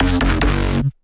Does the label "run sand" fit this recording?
No